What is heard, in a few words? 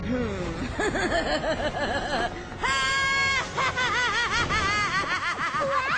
laugh; laharl